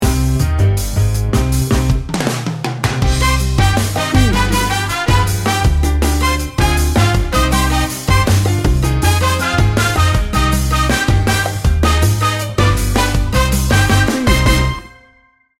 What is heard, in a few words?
sexy salsa 15 second